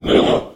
Demon; little robot sound factory

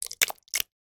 horror guts bloody splat squelch in plastic bowl; sound effects one

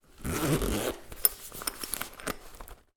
household electric razor pouch zip open

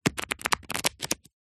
foley paper pages binder flick through fast; zapsplat

